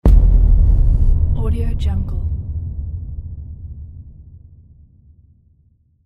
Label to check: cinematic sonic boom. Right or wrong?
Right